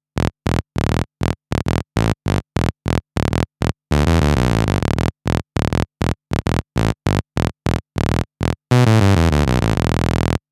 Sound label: Saw, synthe